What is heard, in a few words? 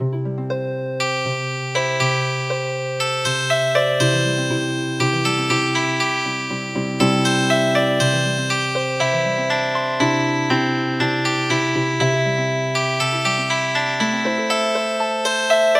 Healin Harp; Candle Dance